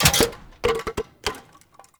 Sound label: Vending Machine Bottles; Ambience; Props; Warframe; Nineteen Ninety Nine